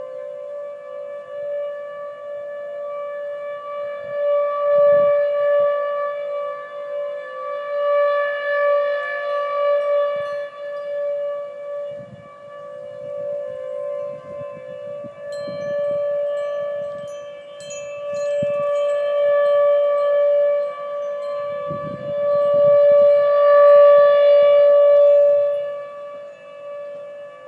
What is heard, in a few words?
emergency preparedness siren